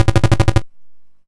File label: YM FX Trigger Finger; SFX; CHIPSHOP DELUXE; CHIPSHOP HITS